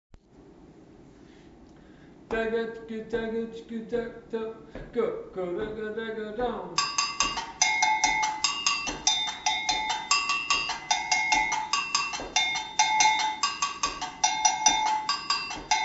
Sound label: samba agogo